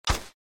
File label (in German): graben erde; Crypt of the NecroDancer